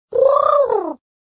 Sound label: sound praise; Monster Arena